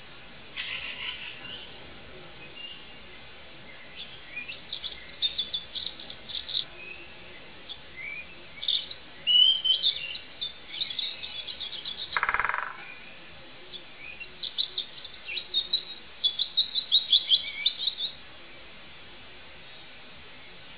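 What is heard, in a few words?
Environment forest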